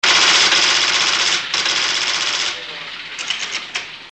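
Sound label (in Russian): принтер хочет взять лист бумаги а его нет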